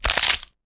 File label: crack; kanji